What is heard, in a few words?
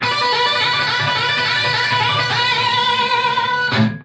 way too widdly guitar